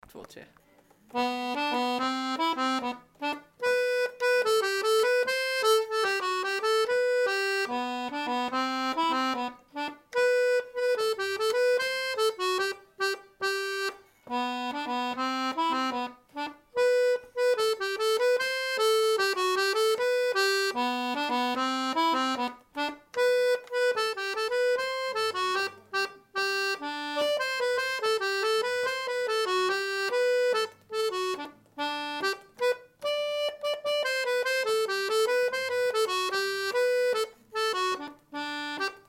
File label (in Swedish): Engelskor från skåne